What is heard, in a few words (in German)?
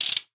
RATSCHE, percussion; Session Instruments; Best Service ProSamples vol.42